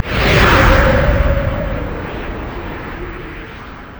yasuo base quickdraw wind; LoL

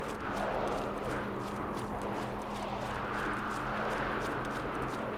stacked deck loop; LoL; twistedfate

